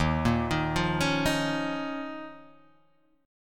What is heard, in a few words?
D sharp 7th Suspended 2nd